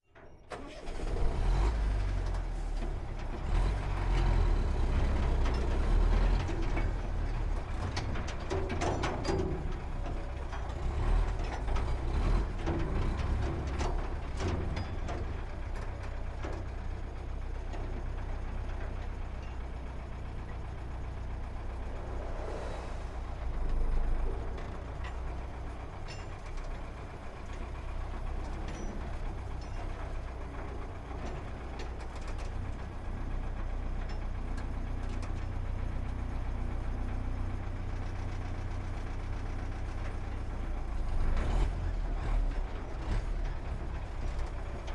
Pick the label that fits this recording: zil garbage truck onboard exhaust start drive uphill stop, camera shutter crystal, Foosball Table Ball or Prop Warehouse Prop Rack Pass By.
zil garbage truck onboard exhaust start drive uphill stop